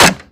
pick up; yuri

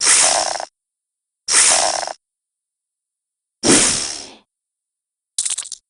snake angry long

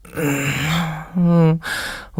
grunt; kzibuki

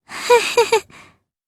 uh heheheh

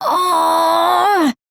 "awww"; jataro

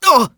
pain; sonic

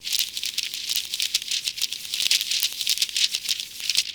book; florent

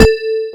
bumper; sonic

